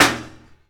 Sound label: Hit Golfball Metal; Misc Sports